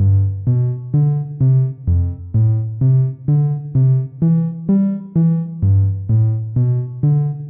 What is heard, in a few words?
stem bass, music loop; vellum